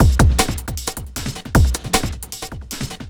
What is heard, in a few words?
Beat, Futurist Drum & Bass; Drums & Percussion